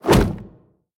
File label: hit; SamsonGame; destroys